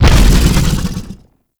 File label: Biped Vocal Death; Warframe; ManInTheWall